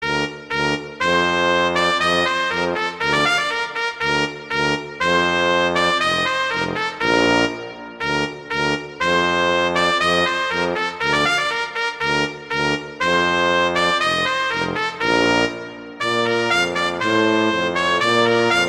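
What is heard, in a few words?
Duet, horn; Mozart; Duets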